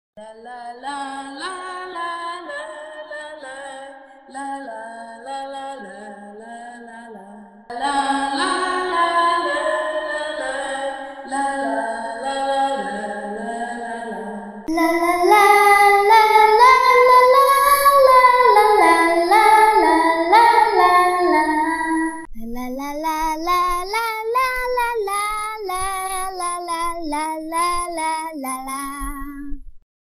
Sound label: la la la creepy little girl singing sounds horror creepy sound effect horror